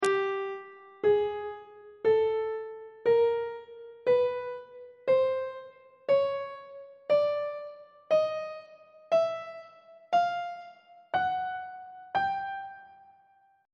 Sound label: a double flat chromatic scale 1 octave of notes on treble clef